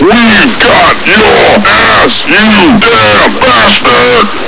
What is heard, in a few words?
cheer; svencoop; voice commands; Grunt